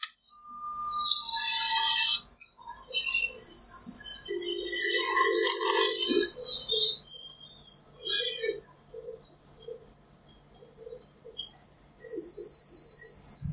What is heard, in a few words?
coffee filling; svencoop